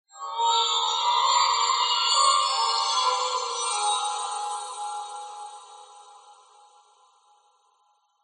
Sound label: whistles shrill unpleasant metallic swirl pattern disorganized musical; Skyclad Sound